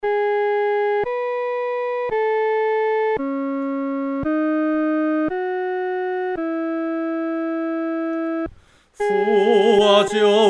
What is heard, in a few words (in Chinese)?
赞父奇爱 独唱（第一声）